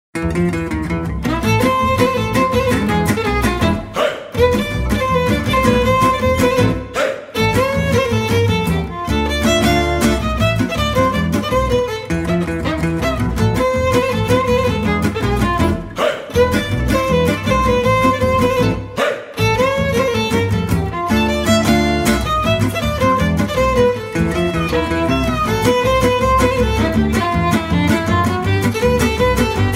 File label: klezmer a moll